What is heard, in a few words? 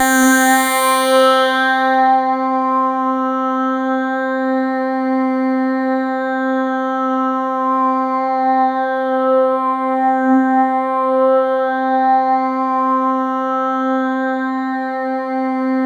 C4, Synth Line; NiceBeats